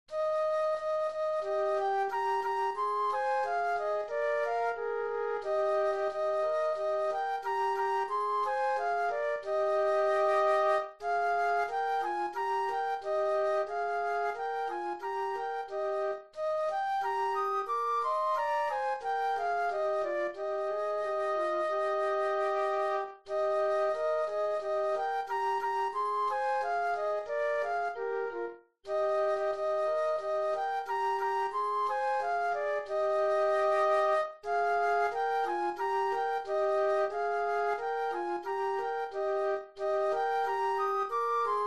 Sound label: Flute Duet